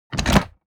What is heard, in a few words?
object mechanical lever wood small up